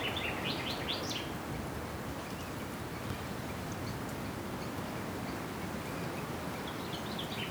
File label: Waterbird, ambience